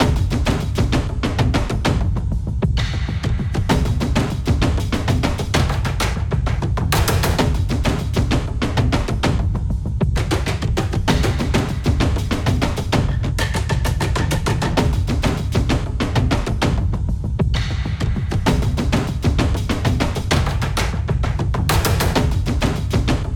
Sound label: Mus Action Perc High